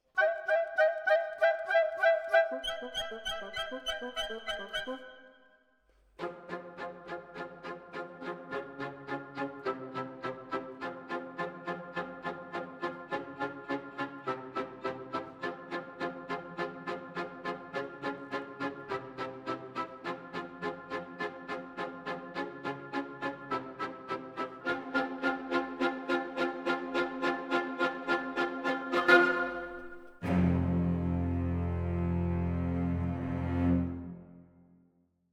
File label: Orchetra, Jazz for piano